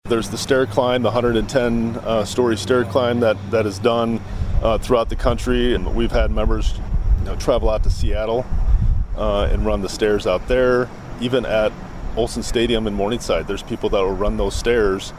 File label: SIREN; sioux city fire rescue pays tribute to 9 11 victims